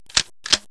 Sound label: GUN